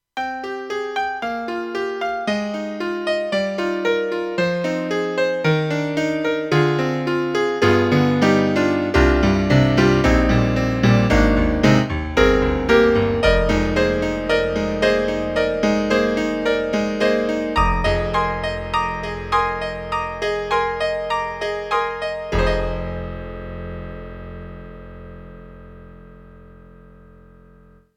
Yamaha TG100 GM Grand Pno

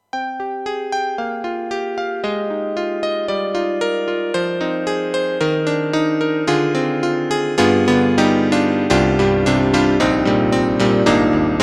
Yamaha MU500 (GM2) El. Grand

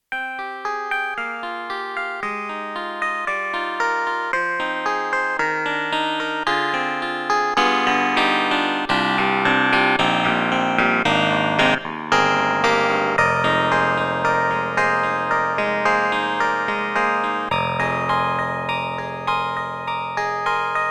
Yamaha MU1000EX Clavi K

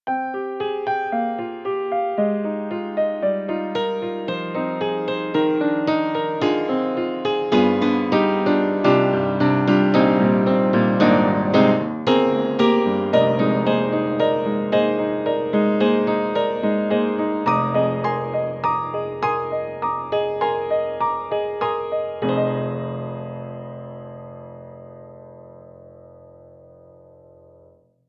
Upright Piano Close; Steinberg; The Grand 3

